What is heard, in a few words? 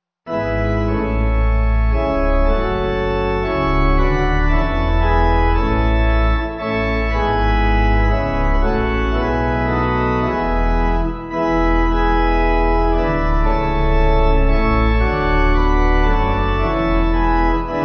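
Pipe, Pipe Organ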